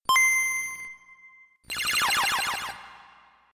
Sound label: game boy boot up